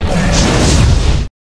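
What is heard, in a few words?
Dragon Skeleton attack up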